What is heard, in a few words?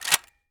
fal slider; Escape from Tarkov